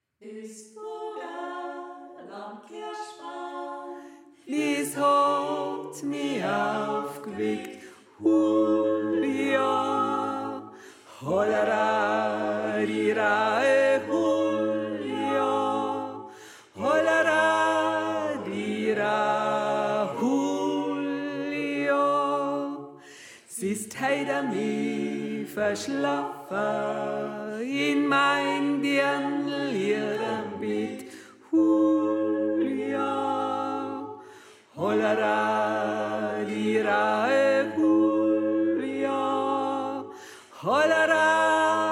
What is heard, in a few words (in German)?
Tenor; Des Vogerl Am Kerschbaam